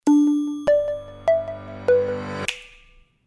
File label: Boot sound; ZTE Maven 2 Z831